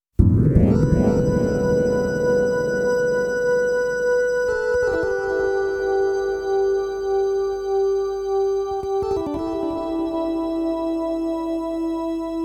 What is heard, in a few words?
Dub